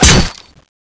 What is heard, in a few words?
turbulent wood, weapons; cstrike